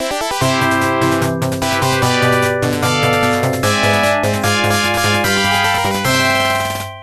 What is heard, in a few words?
Unused Jingle; 1943 The Battle of Midway